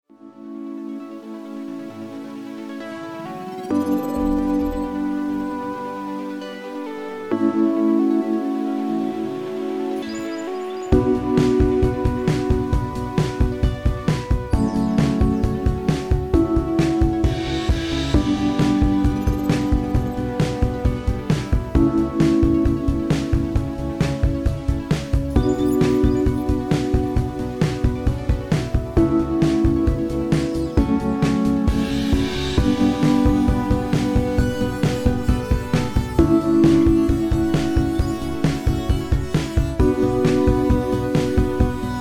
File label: Inst 133BPM